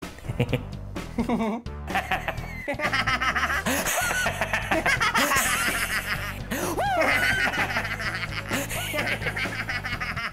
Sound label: laughing contest